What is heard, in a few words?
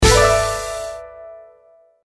hit; YunCaiTongZi